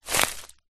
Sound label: footstep forest floor crunchy leaves; foley